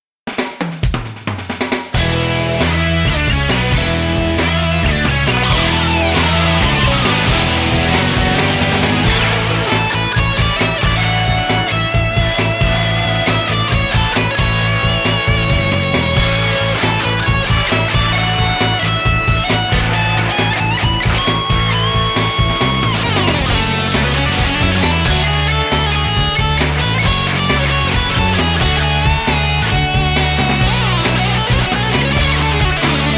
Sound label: Rock